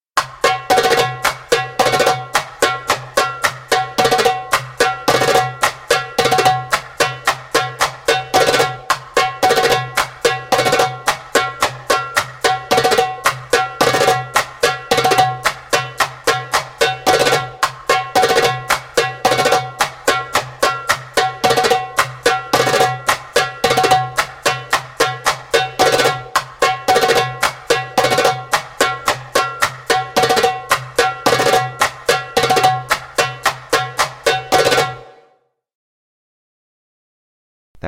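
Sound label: Awkward Accents (fast) spoken, djembe; Djembolale - Fills and Drills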